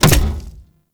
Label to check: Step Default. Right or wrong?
Right